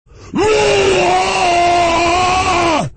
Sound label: Scream